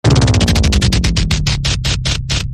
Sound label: PwDn Short Out